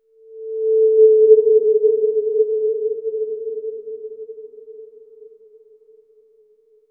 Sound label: painful ahh; misery